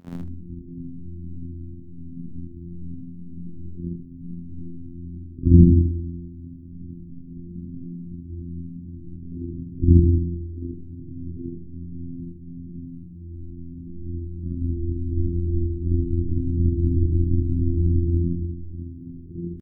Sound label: test shake